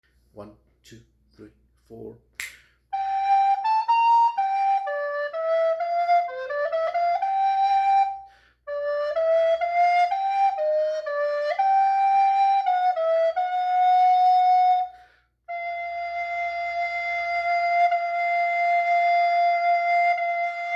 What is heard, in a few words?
Alto Part H, recorder